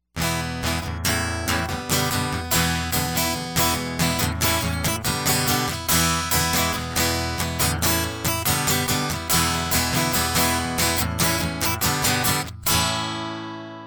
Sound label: Guitar Loop; Exoskeleton